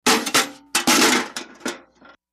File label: Kicking Bucket; barrels buckets & cans